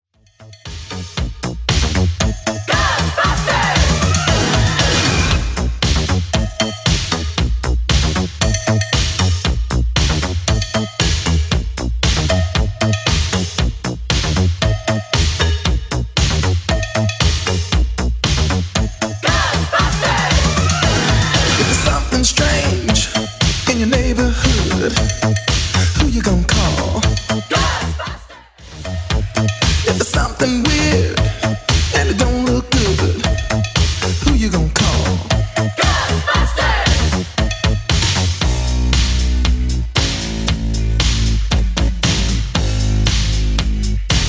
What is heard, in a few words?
80s Dance 116bpm; Extend